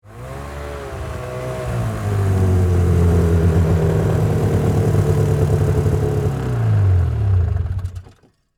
Snowmobile Approach, Sports, Snowmobile, Approach, Shut, Off, Yamaha, Exciter, 440; Miscellaneous Sport